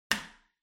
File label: Light Switch Box Rocker Hall Hard